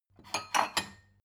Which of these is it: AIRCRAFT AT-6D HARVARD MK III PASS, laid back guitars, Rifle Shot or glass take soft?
glass take soft